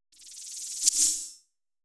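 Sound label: percussion, ambient, 160; eJay; Drum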